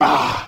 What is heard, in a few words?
"ah" exhale; Voice; ooh ahh